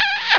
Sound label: kiss; mk2